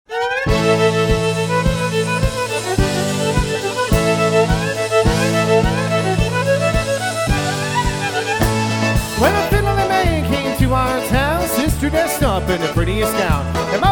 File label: Polka, Live Jam